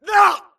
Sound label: grunt death neck; Titanfall 2